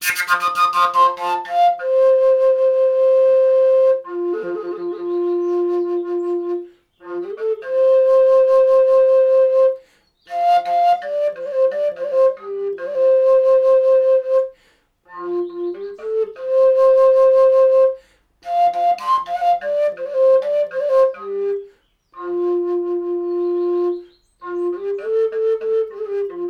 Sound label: Fujara F